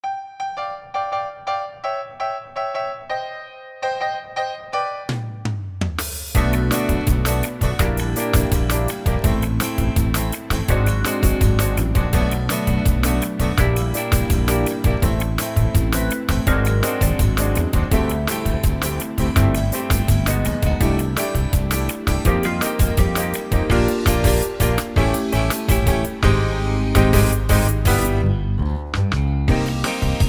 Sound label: SINGBACK; LATINO